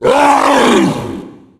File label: hit; umbrella swarm